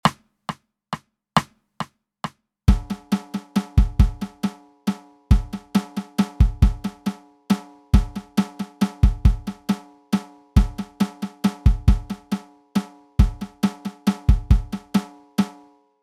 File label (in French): festin batterie